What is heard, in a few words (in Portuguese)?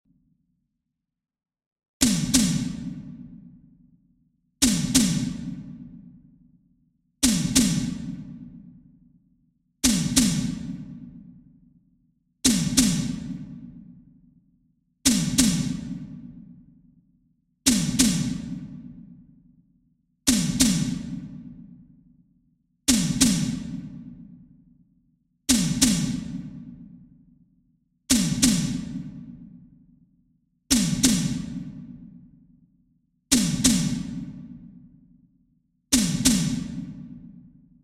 Toque - Surdo Medio; fanfarra